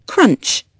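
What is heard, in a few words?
monsters infect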